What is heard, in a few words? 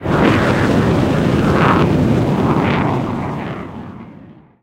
burning jet; tanks; War Thunder